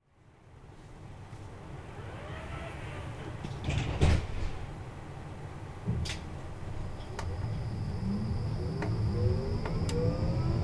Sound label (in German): Fahrsound S Wagen; bis August 2021